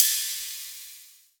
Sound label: Ride, Cymbals; Mutekki Media; Vengeance Electroshock Vol.2